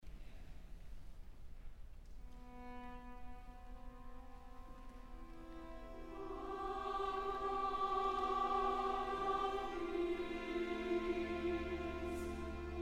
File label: satb orchestra; the god of peace